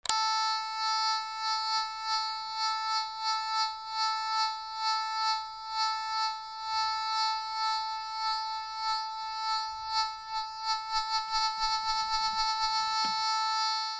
BUZZERS

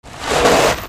CARS

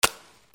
FIRE

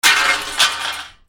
FALLING

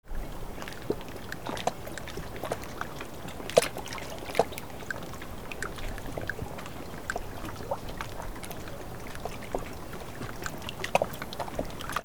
WATER

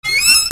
SQUEAKS

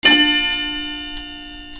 BELLS

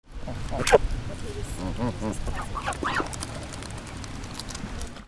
BIRDS

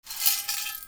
MONEY

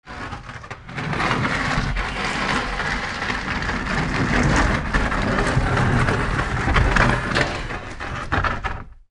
FRICTION

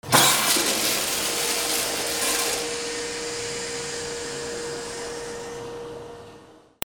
BATHROOM

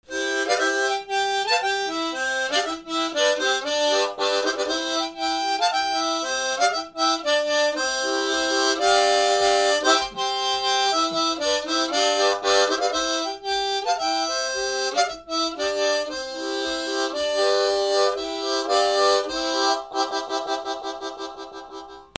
MUSICAL

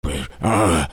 PEOPLE

